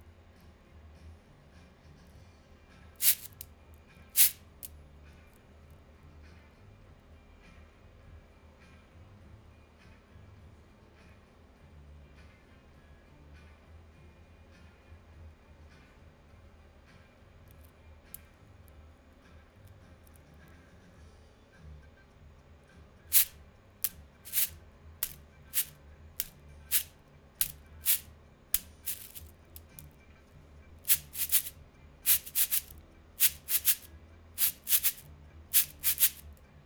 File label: twisting shaker thing